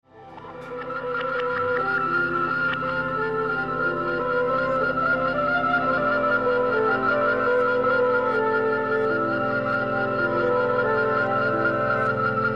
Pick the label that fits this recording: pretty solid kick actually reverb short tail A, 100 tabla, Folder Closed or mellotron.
mellotron